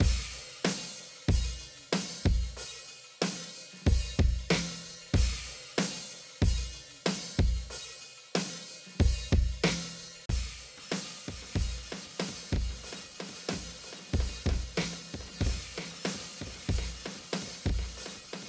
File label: Tape Delay Cross Wired